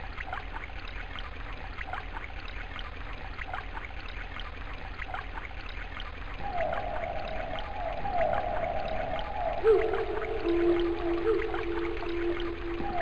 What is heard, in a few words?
AMBIENCE